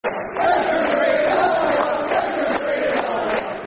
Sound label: West Country La La La, Football Chants (Soccer Songs); Plymouth Argyle Pafc